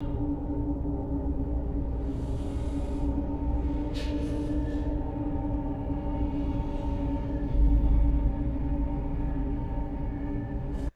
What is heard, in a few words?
low ominous gritty ambient drone; ui